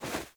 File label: Movement Foley; Wisp